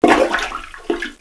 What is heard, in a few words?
plunger